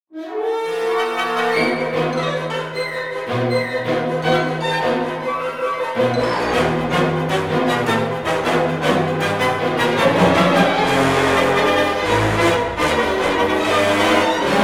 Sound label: brass VS woodwinds